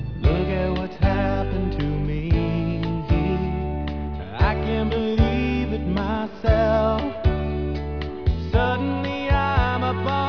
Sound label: soft